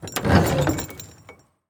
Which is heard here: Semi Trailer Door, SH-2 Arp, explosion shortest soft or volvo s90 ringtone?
Semi Trailer Door